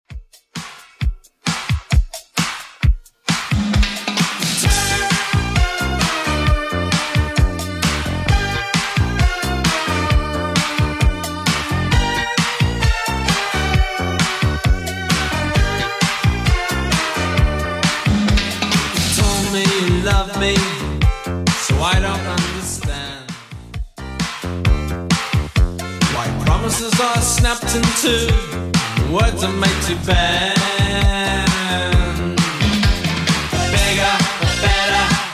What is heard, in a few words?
80s Redrum 132bpm